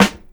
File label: 00s subby snare g key